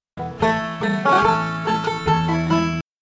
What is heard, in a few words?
cool first string lick, banjo